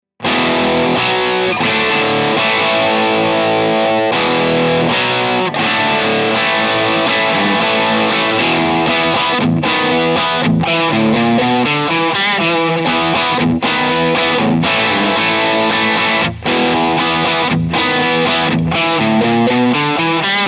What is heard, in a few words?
rock chords; jensentone